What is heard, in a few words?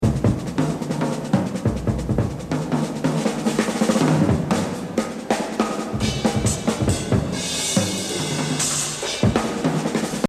Exercise, jazz drumming; Buddy Rich; The Evolution of Jazz Drumming (Gottlieb)